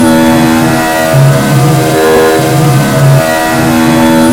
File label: V6T off high; AOS F1 2022